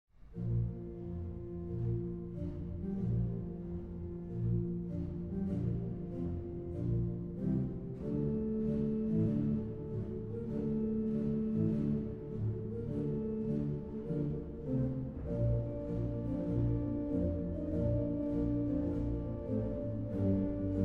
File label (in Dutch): Alla Marcia (hoofdorgel); Psalm 87